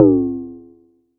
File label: Perc